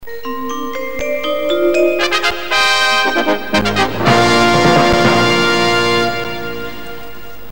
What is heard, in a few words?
Jingle; LWT